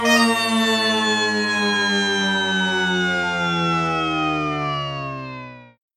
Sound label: orchestra ending